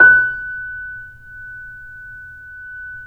C7; East West; The Ultimate Piano Collection

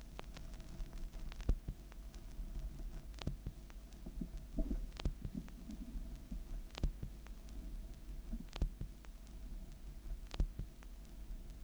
Crackle Runout, Vinyl Noise

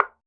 Bongo Hi Slp; Percussion

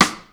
stick rock, Yamaha Motif ES-Series; 300 Drum Machines

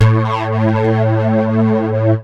dance, bass

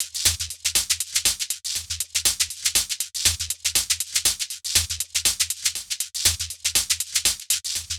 Hh Sh Loop 120; Equipped Music; Loungin House

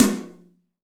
ROOM TOM; EdgeSounds; Drum Mashines; R-8 DRUMS

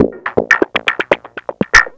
Percussive

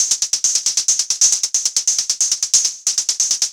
Anabeat Hat; Sonic Foundry (Sony Creative Software); Prototechno

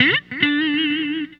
Guitars; Total Drum&Bass; 90 s Sample CDs

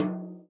TOM, Real Drum Kit; Best Service ProSamples vol.43; JUNGLE KIT

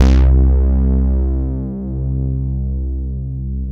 TS 10, Analog, Synthesizers; Soli-Music; World of Synthesizers VOL-1

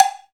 COWB, Cow Bells, Roland; Club-50 - Foundations Roland; CowBel+Metl

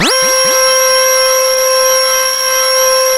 JV-1080

